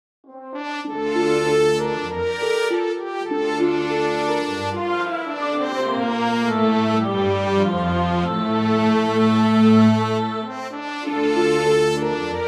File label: cinematic